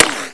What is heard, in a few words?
ric, bullets